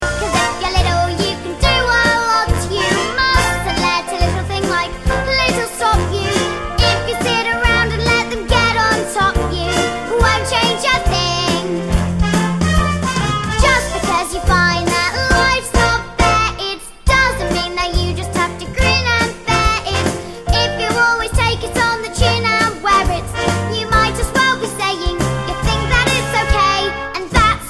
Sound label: Vocal Guide